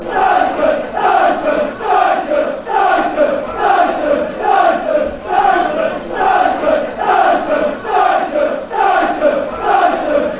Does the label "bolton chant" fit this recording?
Yes